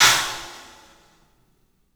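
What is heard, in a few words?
CLUB CYMBALS; 3D Sound; 3D Drums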